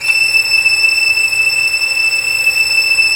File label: Violins, Arco; Arco Violins III